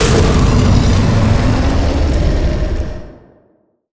Rage Activate

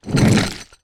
Punch

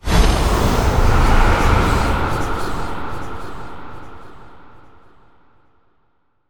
Signus Spawn

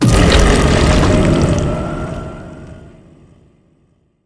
Ravager Death

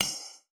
Magical Rock Mine

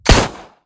Shot; Elster

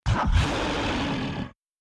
Great Sand Shark Roar

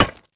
Mine

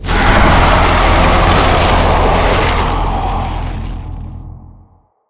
Charge

